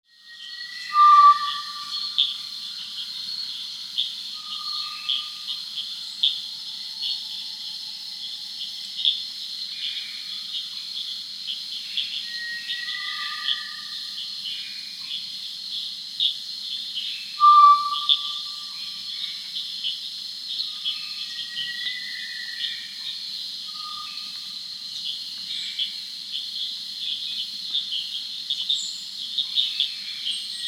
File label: Gray Tinamou (Tinamus tao), Venezuela; S. America